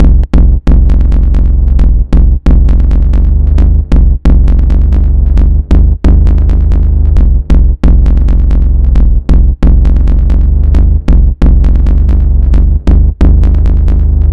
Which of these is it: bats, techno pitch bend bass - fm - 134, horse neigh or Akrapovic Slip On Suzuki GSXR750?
techno pitch bend bass - fm - 134